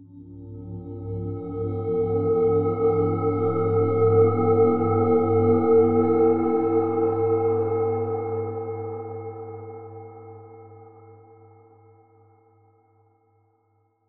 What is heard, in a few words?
suspense; DayZ-Epoch; dayz sfx